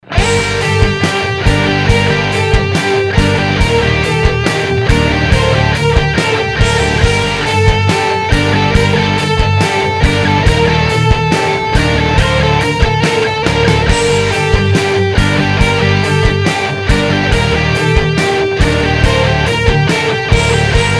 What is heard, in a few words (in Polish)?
schecter dla snu, 7string